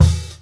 Kick and Hat; Hits

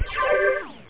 blip; G-Netix; ISM

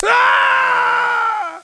sarge die; Army Men TiS

